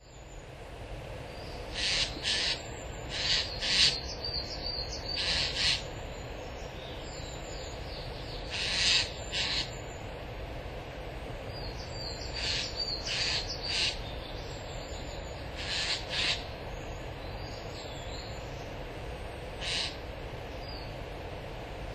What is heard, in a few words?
jay